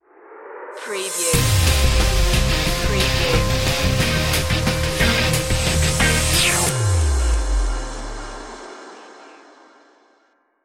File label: slow jam rock stinger